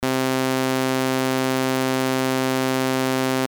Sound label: Sin Saw